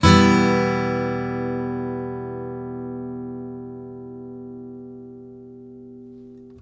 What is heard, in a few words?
UP, Blues Guitar; ILIO; Fretworks